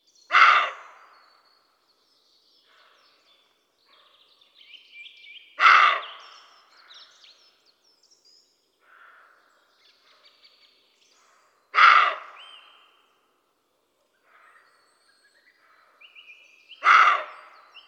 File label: Capreolus capreolus